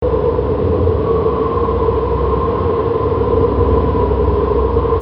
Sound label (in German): windheulen